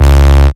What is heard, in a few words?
Bass Shot C#; Mutekki Media; Vengeance Electroshock Vol.2; Bass Shots